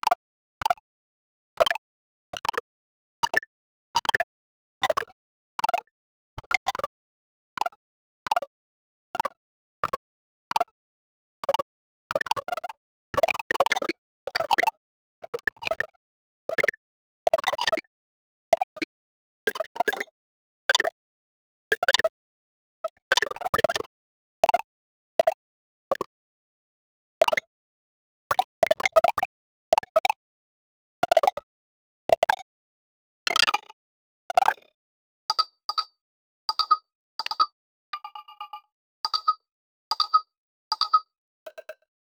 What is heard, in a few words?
variety of different use, shooter